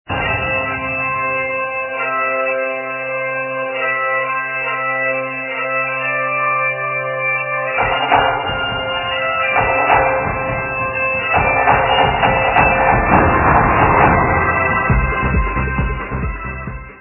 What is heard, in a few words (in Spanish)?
gaita irlandesa